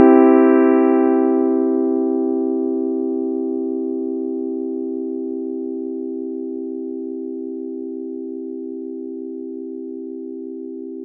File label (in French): do mi sol